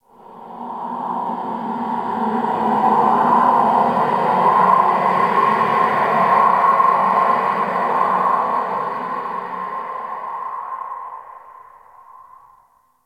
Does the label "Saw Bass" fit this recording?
No